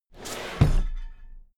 drawer cabinet equipment close heavy halfway

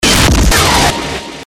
impact explosion

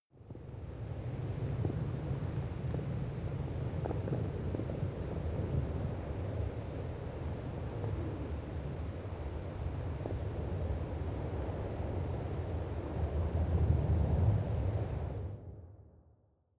water fx clicking

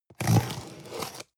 cutter cardboard open

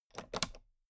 toolbox latch close regular

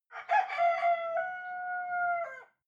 cock calling

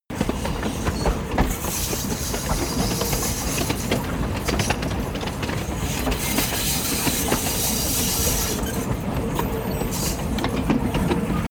train interior screech loop

